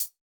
hihat, Acoustic

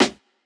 Jazz hop snare

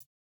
no effect j city pop 1980 hihat

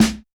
snare, snare house

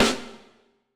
90s hiphop snare; Reference beat bpm90

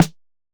Hiphop snare; Dry original

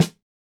snare samples, Rock

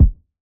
Hiphop kick; Reverb+EQ+Comp+Limit

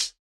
hihat, house

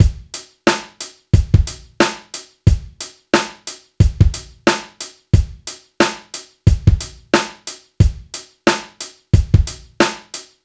Rock loop; Reference Beat

